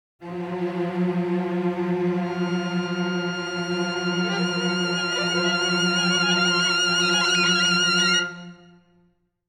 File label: Suspense violin strings eerie dark tense horror